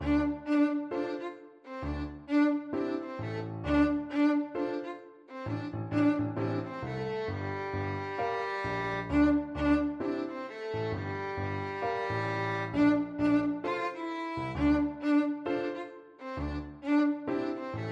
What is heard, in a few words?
violin